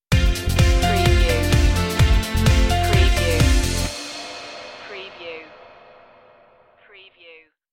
corporate ladder stinger

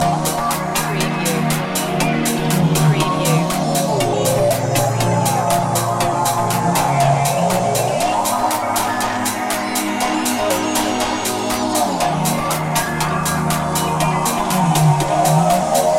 soft techno pulse loop

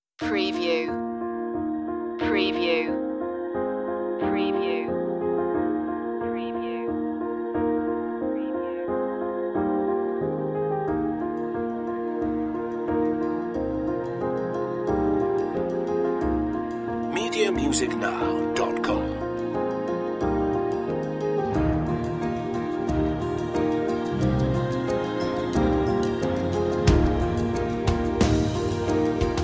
uplift anthem

